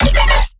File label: bass hit, Bass Stabs; dolphins2; Virtual Riot